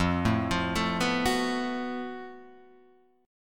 F sharp Minor Major 7th Double Flat 5th F

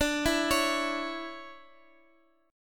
D Major 7th Suspended 2nd Guitar Open F, chord; arpeggiated

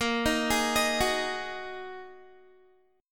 Bb 7th Suspended 4th Sharp 5th, Guitar; arpeggiated; 18,18,18,20,18,x; 4E,4A,5D,5G,5C,6F; Bb7sus4#5

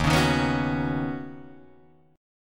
Eb Minor 7th Sharp 5th Eb Guitar Drop D

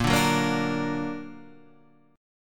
A sharp Augmented Guitar Standard; A#+ strummed; JGuitar

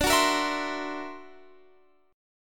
D Augmented 9th, guitar

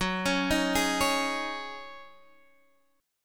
Db Suspended 2nd Suspended 4th Gb, chord

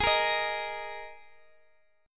Gsharp Suspended 2nd, Guitar, Open G; x,x,x,13,11,13

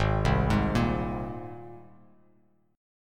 Ab Major Add 9th Ab Bass Standard; Abadd9 arpeggiated; JGuitar

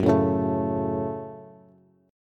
Gb Minor Gb Bass; strummed; JGuitar

